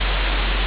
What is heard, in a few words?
ambience room tone